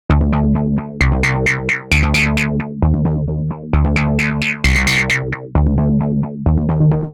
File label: C Minor 132 bpm, Bassline; MIDI Clips; Tonal; Absolute Filth; Skitter and Step; Ableton Factory Packs